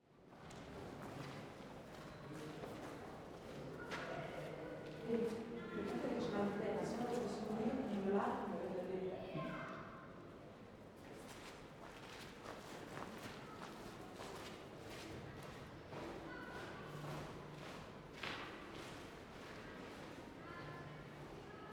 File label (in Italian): strada coperta; Un modo di esplorare un territorio fondato sull'ascolto